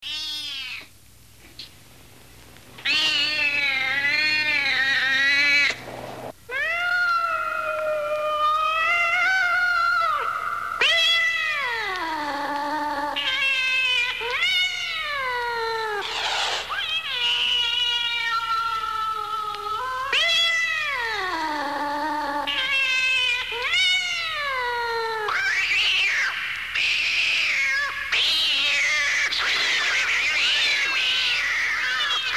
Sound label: Fighting Cats; Halloween; Hallmark Halloween 1989